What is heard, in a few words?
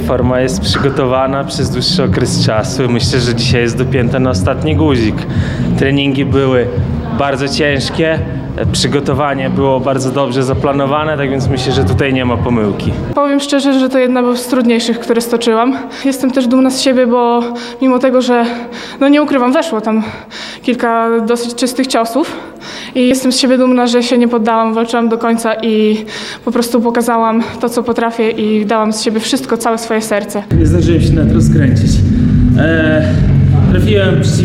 kick boxing; gala paluska kickboxing night 4